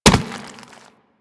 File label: Infested Runner Step Default; Warframe